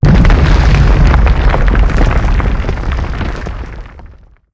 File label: building collapes